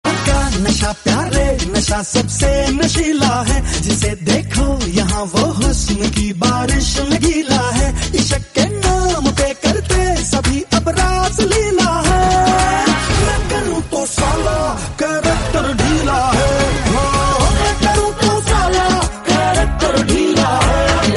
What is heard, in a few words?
Bollywood song tone ringtone